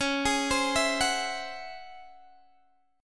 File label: Db Minor Major 11th Db Guitar Open D